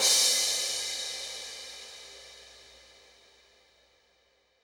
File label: 135BPM Crash, F# minor; Drums & Perc